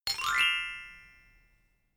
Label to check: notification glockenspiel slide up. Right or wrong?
Right